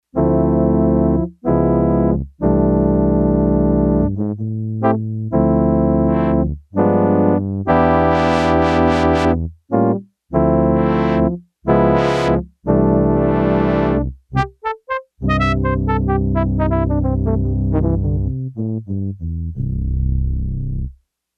MellowHorn, Yamaha TX802; Factory Patches; Voices

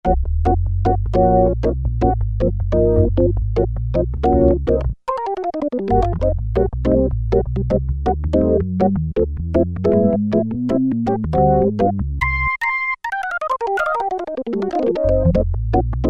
Organ, Roland Juno-60; Factory presets

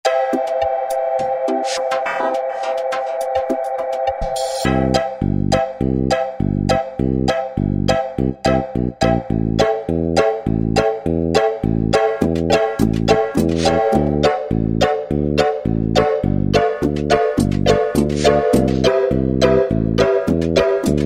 Sustain Pedal Jam, Korg Wavestation A-D

